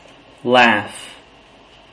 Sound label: laugh